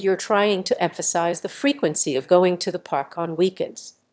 Munching; S2S-Bench; noise; stress

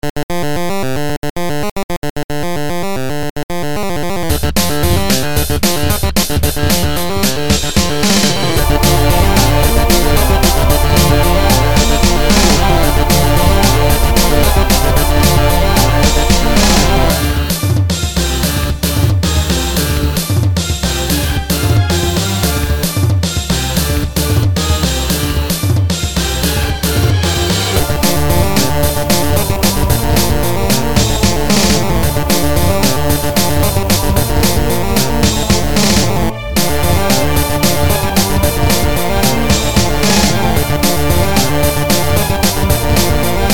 instrumental

